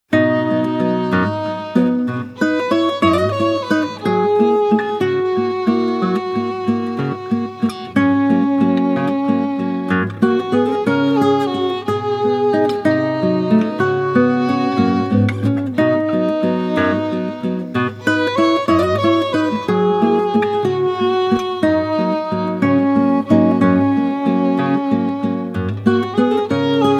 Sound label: Waltz